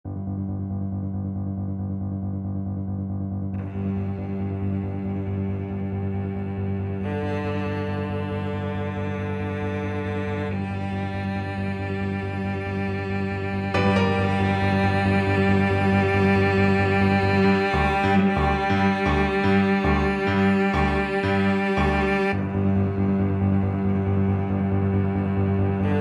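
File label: violoncello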